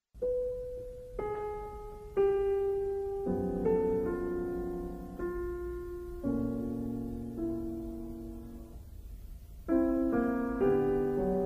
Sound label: Three Piano Pieces No 1; Schoenberg